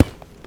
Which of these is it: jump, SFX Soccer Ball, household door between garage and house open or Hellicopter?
SFX Soccer Ball